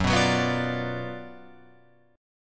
Gb Major 7th Suspended 4th Sharp 5th Gb down Guitar Open F; GbM7sus4#5 strummed; JGuitar